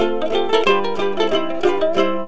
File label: three ukuleles